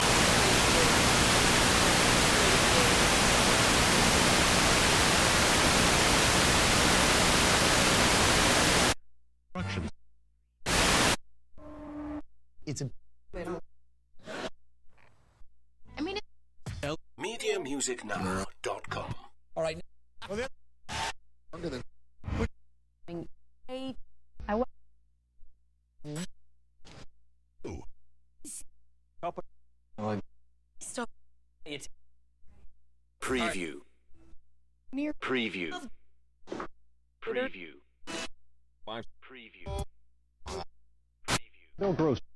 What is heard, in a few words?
Sony Tube TV Digital Tuning and Snow